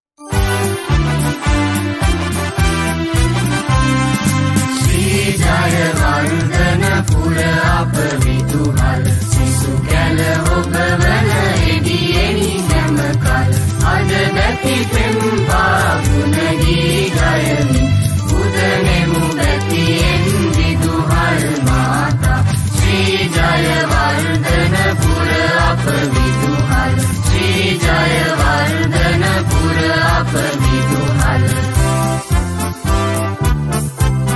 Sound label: School Anthem